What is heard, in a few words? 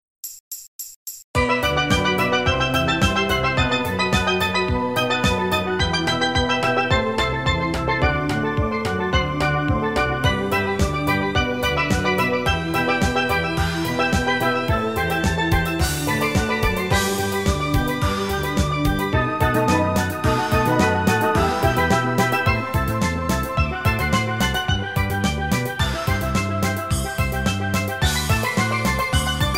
steel drums mid